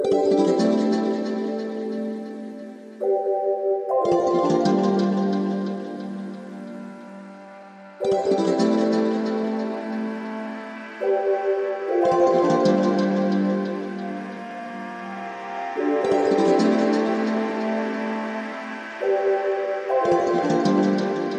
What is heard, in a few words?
No Drums No Bass